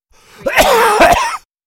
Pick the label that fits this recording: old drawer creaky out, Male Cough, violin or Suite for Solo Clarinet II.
Male Cough